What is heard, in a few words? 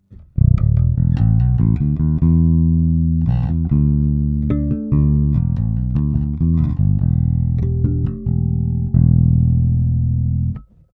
Jbass Fingerstyle Bassboost; HB Block 800b